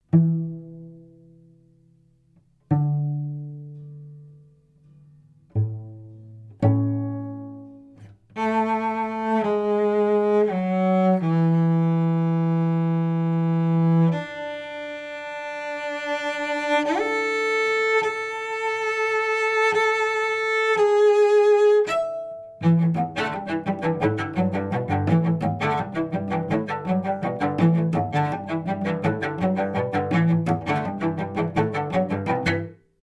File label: cello; Black Lion Audio B172A